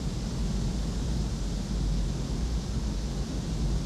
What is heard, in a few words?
Ambience Loop, outdoor